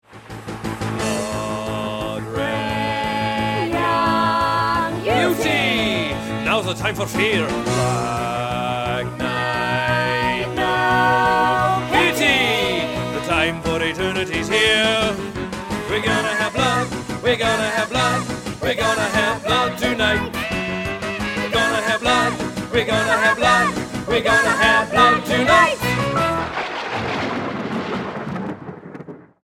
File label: rock; dracula